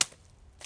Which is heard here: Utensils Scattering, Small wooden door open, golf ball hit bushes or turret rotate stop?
golf ball hit bushes